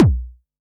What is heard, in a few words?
Analog SY1 Kick